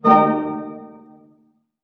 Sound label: harp hit A MAJ; Sound On Sound - Peter Siedleczek's Orchestra